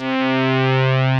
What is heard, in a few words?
SOFT BASS; Masterbits; Dance Trax